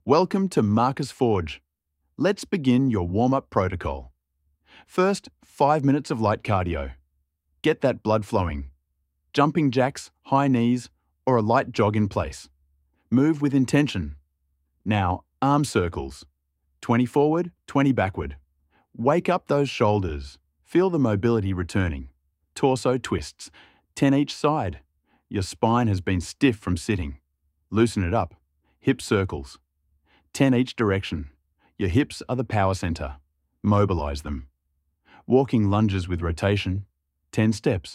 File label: warmup, voice coaching